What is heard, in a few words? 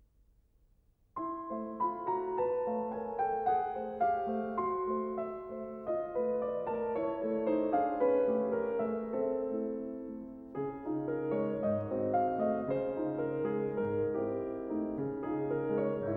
Improvisation No. 5 for piano in A minor; Poulenc